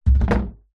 household plastic table set down or knock over wood balcony; zapsplat